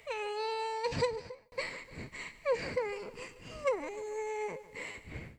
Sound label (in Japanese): nakimusume